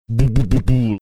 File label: mimicking sound tympanis played